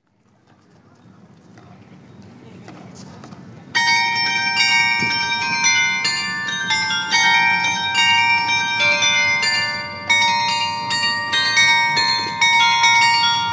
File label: Ding Don, carillon